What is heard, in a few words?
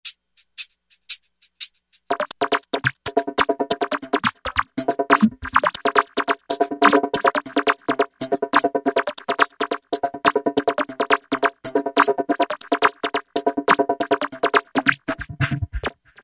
Hi Tech & Nz, Roland V-Synth; Factory Patches